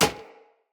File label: Bow Impact Default; Weapon Impacts; Player; Bows; Environment; Envoy Hunter Bow